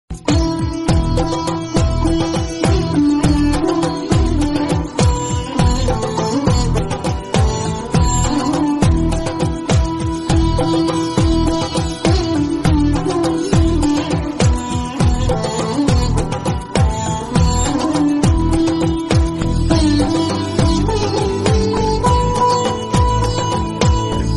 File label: om namah shivay flute version ringtone